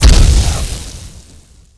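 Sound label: Light Explosion, Grenade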